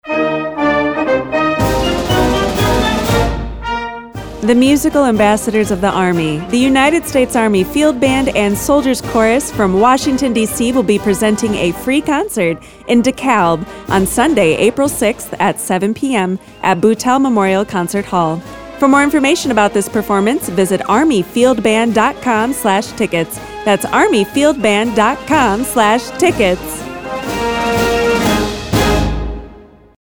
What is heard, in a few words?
Army Field Band; US Army Field Band and Soldiers' Chorus; The United States Army Field Band and Soldiers’ Chorus will perform a free concert; DeKalb IL; NIU; School of Music